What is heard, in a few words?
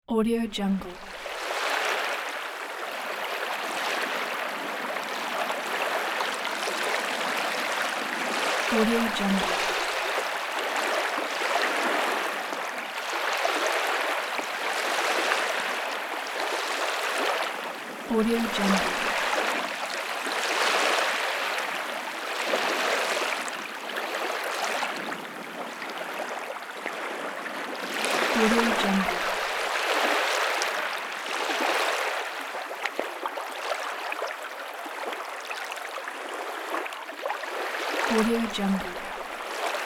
river waves